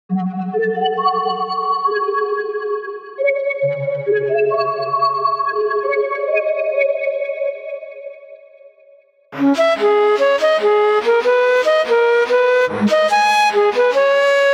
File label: Leads Hip Hop Rap; Melody Demo; Unison MIDI Wizard Plug-In